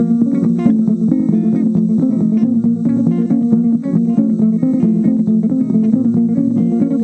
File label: plena